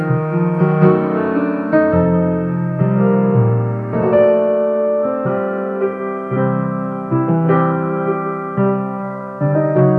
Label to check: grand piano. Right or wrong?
Right